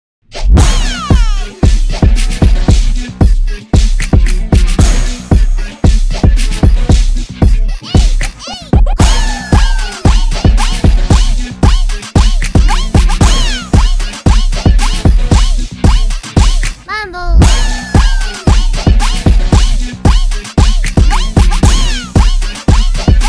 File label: rap remix